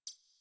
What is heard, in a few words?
Computer Room Beep Misc; Warframe; Ambience; Nineteen Ninety Nine